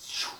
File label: win; Pong XNA